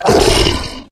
mbloodsucker hit; STALKER; customnpcs

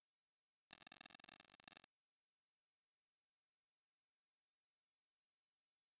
controller attack psi; STALKER; customnpcs

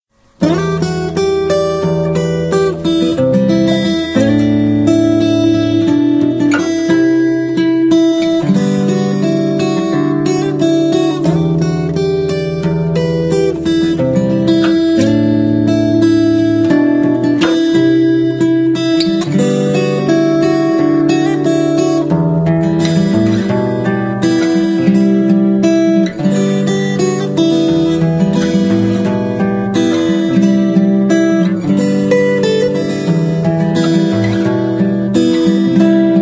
guitar; STALKER

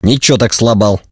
reaction music; STALKER

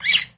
mrat voice; STALKER; customnpcs

